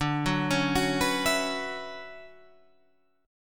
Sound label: D Major 13th D down Guitar Half Step Down